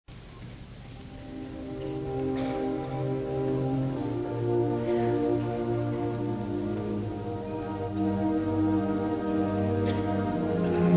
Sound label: Piano Concerto Adagio sostenuto; Rachmaninoff